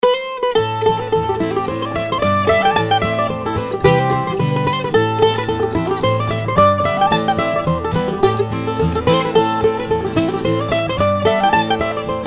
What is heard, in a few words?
celtic-bluegrass acoustic